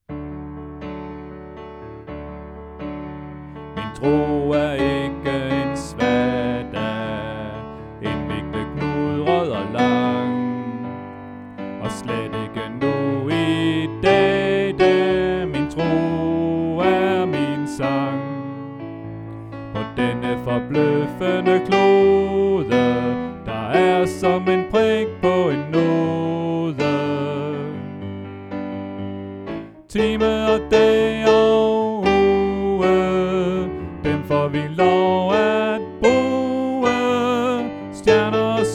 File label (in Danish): Sopran; oevefiler